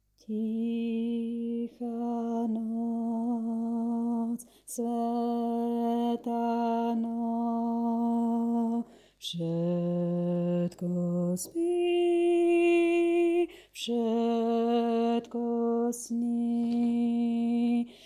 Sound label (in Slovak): Bass; Vianoce 2019 - Tichá noc; Farnosť Letanovce